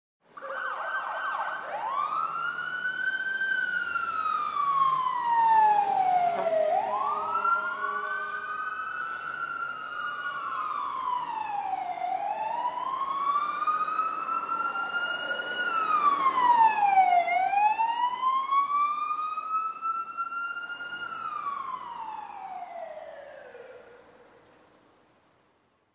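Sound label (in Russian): две пожарные машины проезжают по городу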